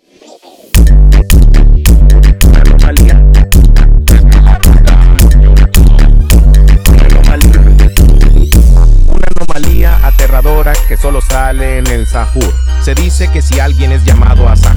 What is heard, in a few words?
bassboost